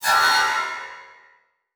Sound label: Transition Metallic Hit